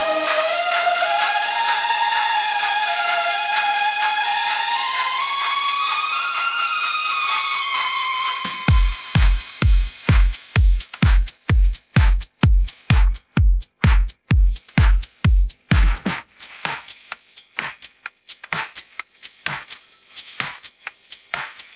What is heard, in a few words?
dancefloor, ringtones; ai-generated